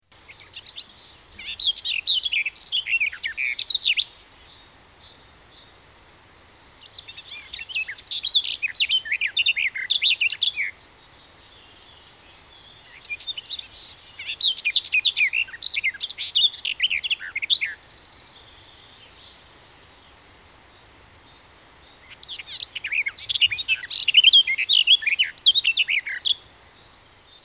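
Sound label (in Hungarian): Kerti poszáta, Madarak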